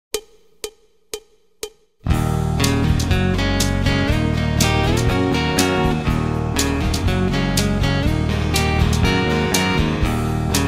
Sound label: Guitar Solo Full Band